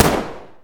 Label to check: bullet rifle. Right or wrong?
Right